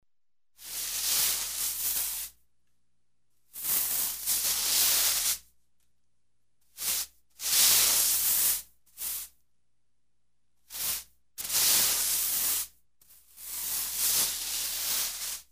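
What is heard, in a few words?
drawn-out broom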